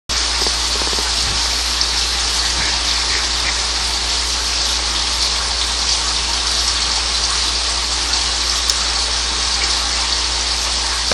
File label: Inside pod